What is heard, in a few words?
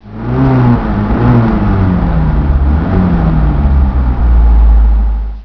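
190sl throttle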